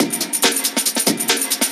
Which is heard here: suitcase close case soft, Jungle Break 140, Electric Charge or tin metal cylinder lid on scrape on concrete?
Jungle Break 140